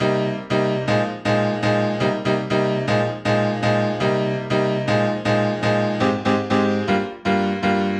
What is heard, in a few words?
Chord Piano Cm, 120bpm; Zero-G; Extra Chord Progs